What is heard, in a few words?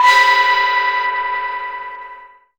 Cymbal, Hip-Hop, Drum Kit